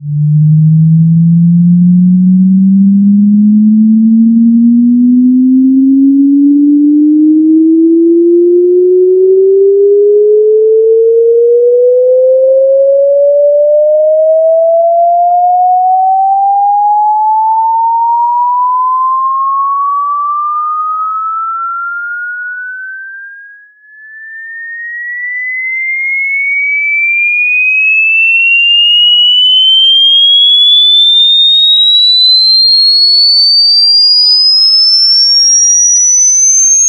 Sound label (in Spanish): sonidos molesto